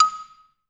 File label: XY LONG D#5, orchestra; Advanced Orchestra; Best Service; Peter Siedlaczek's Advanced Orchestra